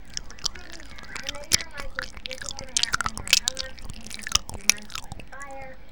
Eat N Smack

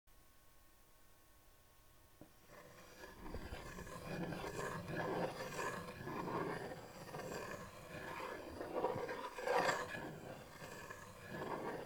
Wood Scratching

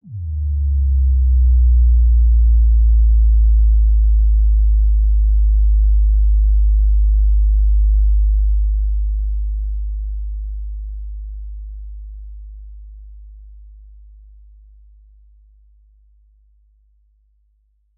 Sub Dive

